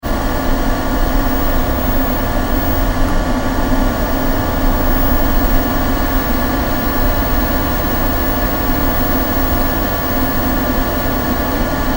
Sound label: Computer Fan Running; Tom Hutchings